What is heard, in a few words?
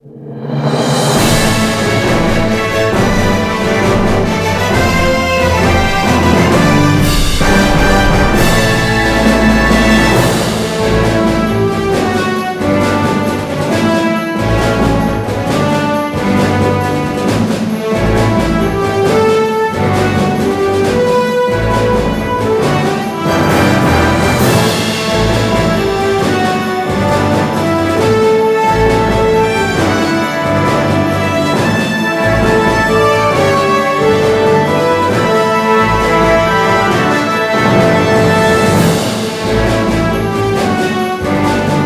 Victory; the wonderful 101